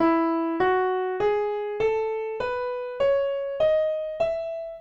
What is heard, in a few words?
E Major Scale